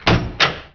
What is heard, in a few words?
basic button, doors; Heretic II Demo